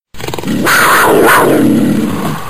Panther Growling Sounds Car Horn

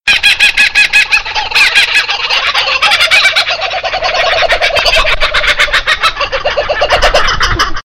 Laughing Kookaburra Birds Sounds Car Horn

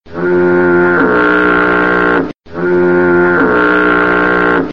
Foghorn Sounds Car Horn

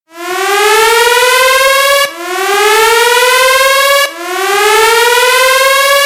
Spaceship Emergency Alarm Siren Sounds Car Horn

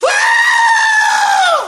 Screaming Goat Sounds Car Horn

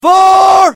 Golfers Fore Sounds Car Horn

